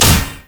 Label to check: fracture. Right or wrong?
Right